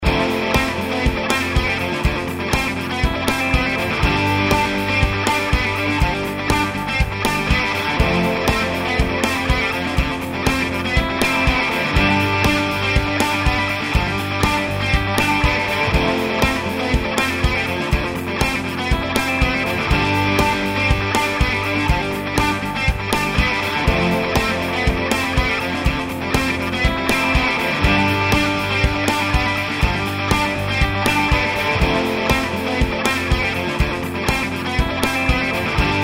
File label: rhythm track